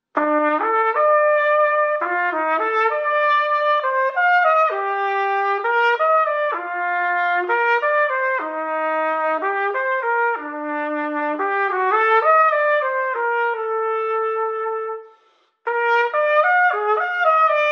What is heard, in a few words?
Lyrical Study